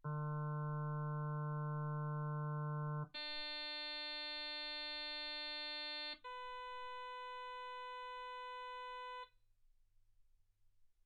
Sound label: harm sinus 48kHz